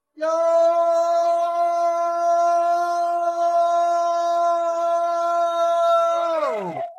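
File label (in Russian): крик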